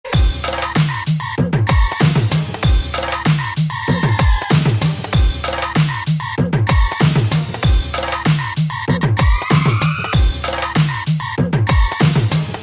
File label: Bangla Bass Drop